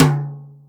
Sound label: Dry T, BossDR660 ht; drum machine